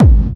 BD, Dance Mega Drums, BD HOU; Best Service

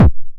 909 BD Tape Sat

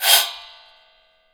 RECO ACCENT, BRAZIL

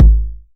909 BD; Goldbaby Tape808 & Tape909; The Tape 909

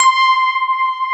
SYN K-2000, Roland

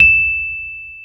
E 6, Electric Pianos; Wizoo

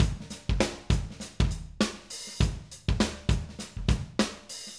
Acoustic Live Drum

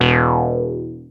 ARP 2600 E2, synthesizer; Hollywood Edge - Giorgio Moroder Rare Synthesizer Collection

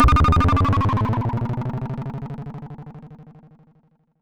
grumble lo; One Shot FX; Sample Magic; Transmission-X

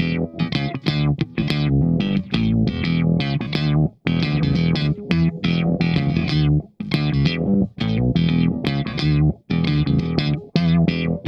SHOT, Funk Guitar; 90 s Sample CDs; Zero G

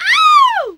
FEMALE "OUUW", VOCAL

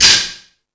Rap Session, E-MU Pro-Cussion

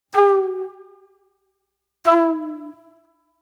Spit Plate Flute

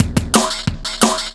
Beat, Drum N Bass

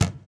CAJON; Univers Sons - Raricussions

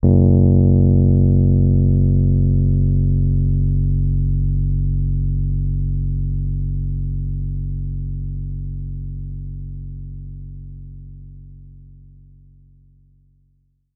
Rhodes forte f#1; Session Instruments

